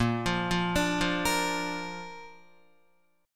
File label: A sharp Major, Guitar; arpeggiated; JGuitar